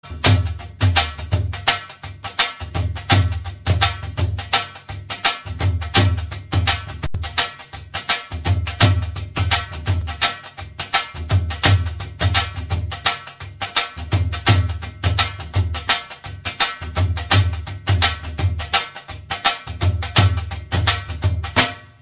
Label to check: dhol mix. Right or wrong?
Right